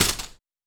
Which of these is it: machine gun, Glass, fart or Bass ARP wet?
Glass